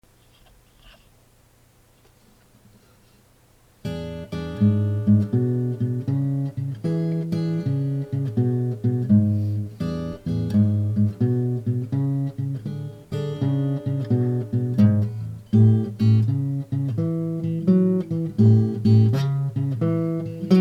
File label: e blues with b7 riffs